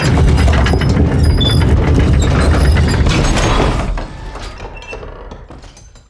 door open; DAoC

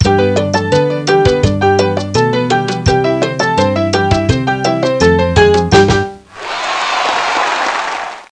Salsa

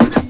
pogo stick, cartoon sfx

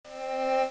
Count Down; FloorTiles

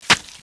stab; duke nukem total meltdown

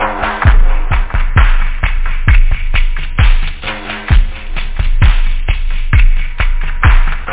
under, loop; da capo vol1

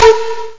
panflute real

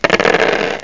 dice roll; YatZ

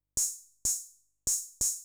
HIHAT HOUSE 125, Drum; eJay

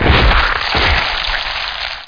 telefrag; Hexen2; player

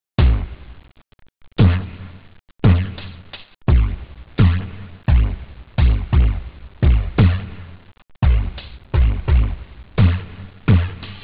beat; OctaMED SoundStudio V1

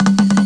bongo lick, cartoon sfx

